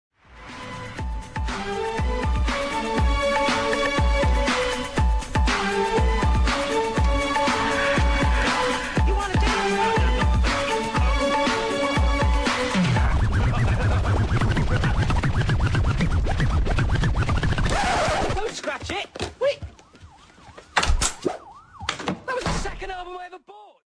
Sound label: electro, ringtones; shaun of the dead